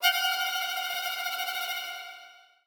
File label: violin horror